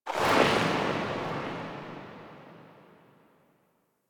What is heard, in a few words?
Bow Tail, Weapons; Warframe; Corpus